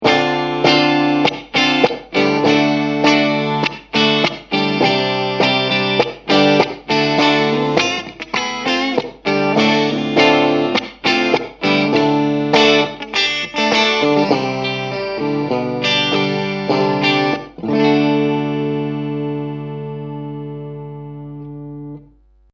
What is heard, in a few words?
String